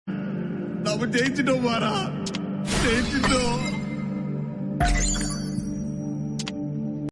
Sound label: background music